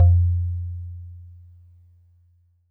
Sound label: Warble Celeste DX100 F1